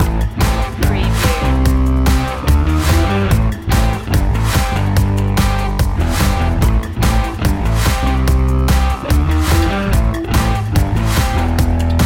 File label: positive force